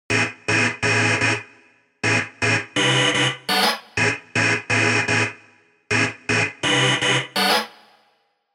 serum 2 house stabs; Soundflation; alonso saloon serum 2 house stabs

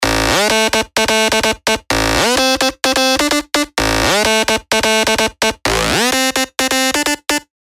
Drop Go Boom, dirty dutch leads; Spire; revealed spire dirty dutch leads vol 1

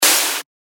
FX Hat, cymbals; revealed cymbals vol 1

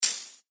percussion; Absinthe; revealed percussion vol 3

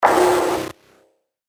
Health, percussion; revealed percussion vol 2

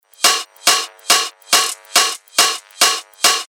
140 BPM, techno loops; Energie; revealed techno top loops vol 8

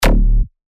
bass shot D; Ranch; revealed bass shots vol 4

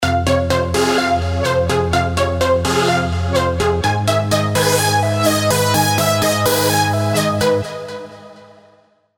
Enchanted Saw, Serum arps; revealed serum arps vol 1

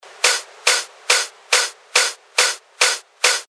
140 BPM, techno loops; Angello; Revealed Techno Top Loops Vol. 9

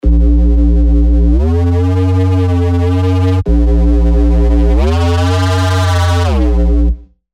Reese Aftershock, techno bass; Spire; revealed spire techno bass vol 1

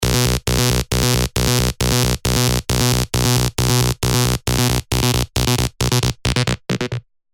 Hacksaw, rolling bass; Revealed Serum 2 Rolling Bass Vol. 1

